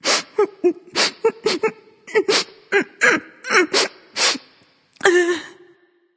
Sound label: boo hoo; smite